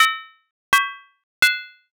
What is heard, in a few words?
dishes